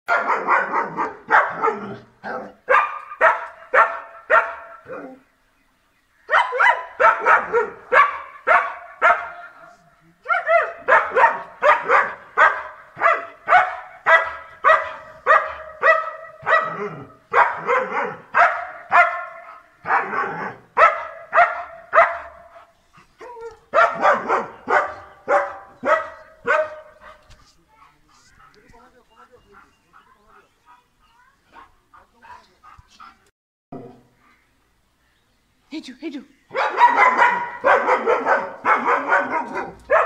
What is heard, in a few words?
German Shepherd